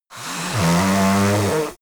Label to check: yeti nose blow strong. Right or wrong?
Right